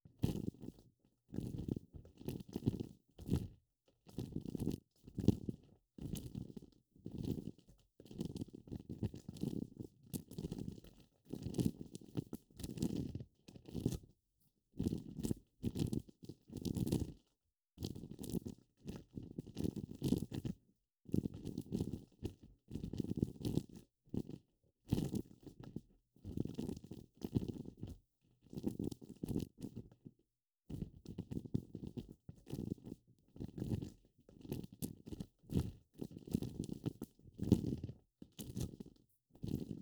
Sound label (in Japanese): 両耳同時耳かき＆両耳に息を吹きかけ, ASMR; 雨宿りの里～耳を澄ませばほら、あなたを癒やす音がすぐそこに～